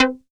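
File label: club sequence; Best Service Dream Experience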